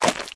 Footsteps; SFX Character

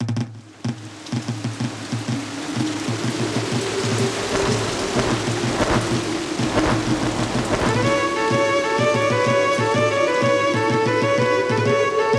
Jib Jig; donkey kong country 2 fan remastered

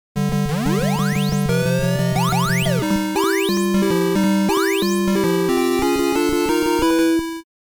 Start Signal

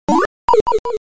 Fall into the Sea; Dig Dug II; arcade

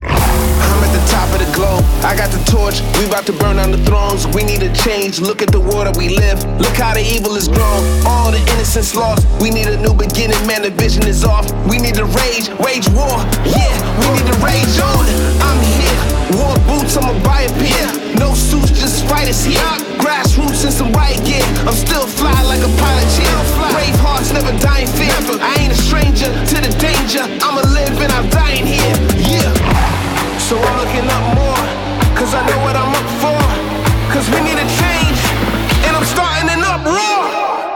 Music Jump

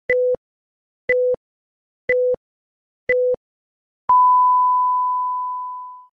Clock alarm; Zenonzard

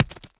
stone land; Scrat

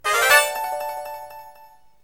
New Galaxy Unlocked; Super Mario Galaxy 2; Wii

